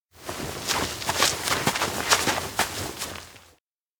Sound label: group approach; anemoi